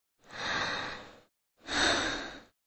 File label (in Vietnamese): Tieng tho dai